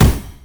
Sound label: displacement flash; LoL; leblanc